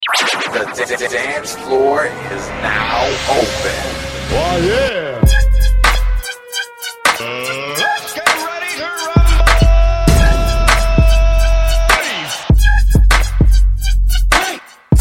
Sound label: mixed tone